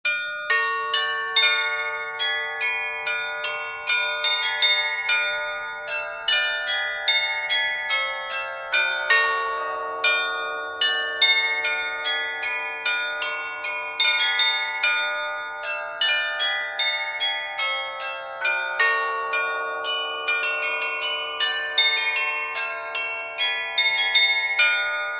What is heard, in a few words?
Bells